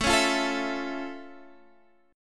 F Augmented Major 7th A

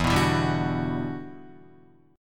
E Minor 9th, down, Guitar; Em9 strummed

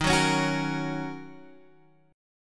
Eb Minor 7th Eb Guitar Standard; strummed

